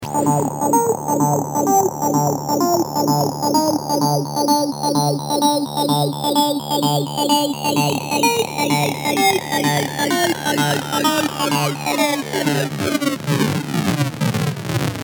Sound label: Bonus Melody 128 Bpm D